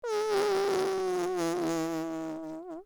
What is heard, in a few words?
whoopee sound